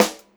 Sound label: 78 STR SD, Funk-Groove Drumloops; USB Soundscan vol.38; AKAI